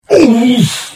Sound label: Hit; The Elder Scrolls III - Morrowind